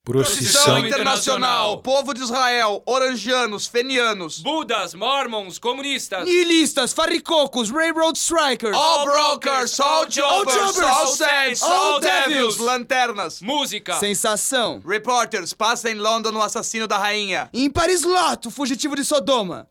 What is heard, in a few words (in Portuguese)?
procissao